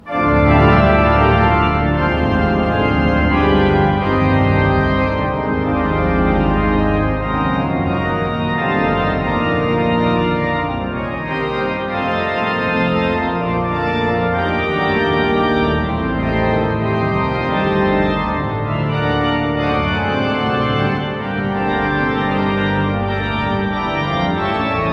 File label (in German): Orgel; Salzwedel Hammer Orgel An der Marienkirche; denkmaltag